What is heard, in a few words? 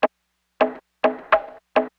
104 HipHop Lofi Perc; Percussion; Misc Perc